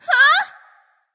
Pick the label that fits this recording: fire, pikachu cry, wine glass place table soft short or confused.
confused